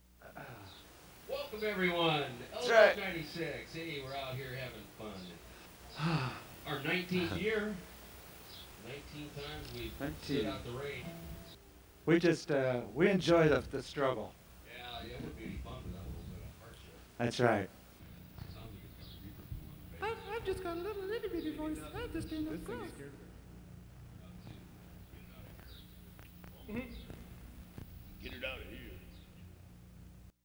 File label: Stage Chatter